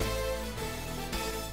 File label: Scene Transition Effect; Devastation